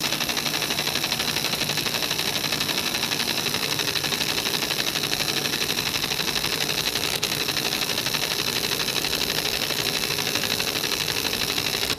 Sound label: continuous rattling mechanical fan buzz; machines